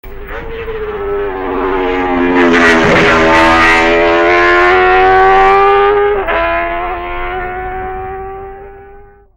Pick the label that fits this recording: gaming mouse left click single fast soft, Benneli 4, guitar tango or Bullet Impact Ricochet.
Benneli 4